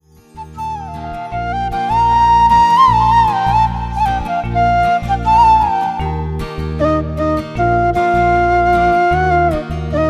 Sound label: flute ringtone